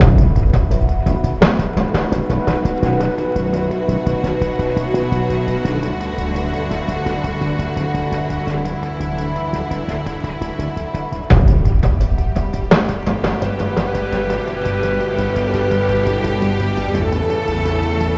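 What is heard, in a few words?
Music Ambient, Ambient Loading 85bpm; Forza Motorsport 6 Apex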